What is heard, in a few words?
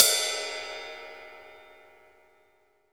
MIX RIDE, MONO RIDE CYMBAL